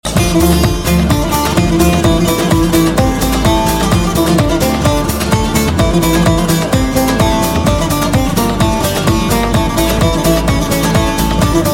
Turkish

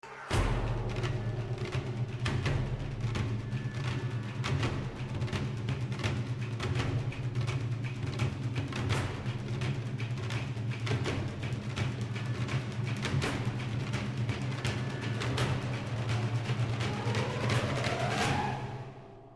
Drums

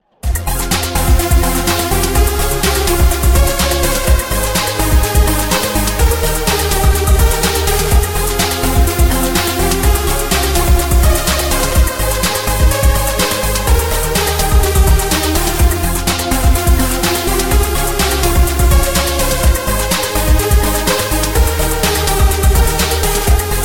Plastic Pop Remix

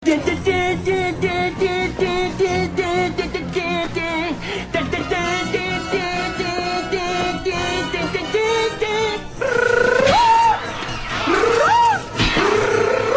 Cable Ringer; Amok

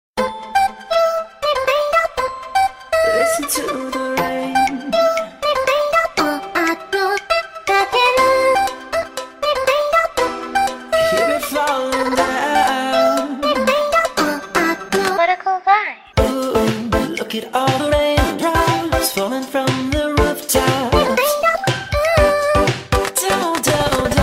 Little Rain Drop

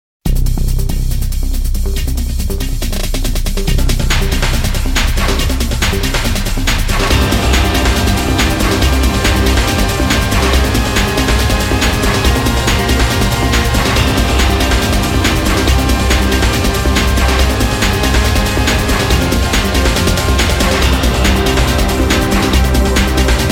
Plosive Attack

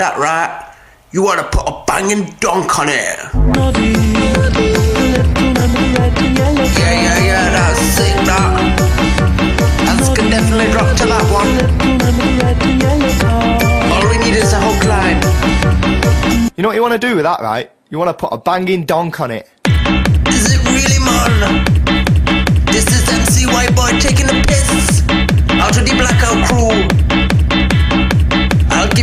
Noddy Donk Tune

